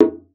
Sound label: CONGA S03, conga; Best Service ProSamples vol.42 - Session Instruments